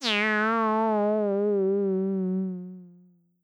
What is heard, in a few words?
cat meow synth low pitched